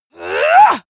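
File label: female combat attack short, screams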